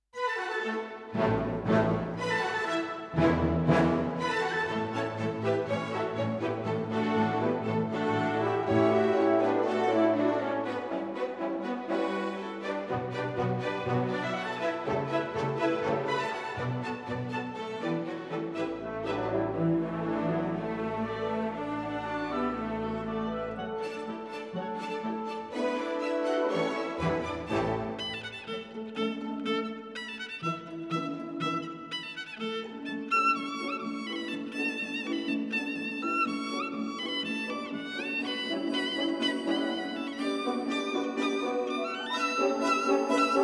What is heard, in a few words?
Fantasia On Cantonese Folk Themes, Chinese Orchestral Music; Chen Pei-Xun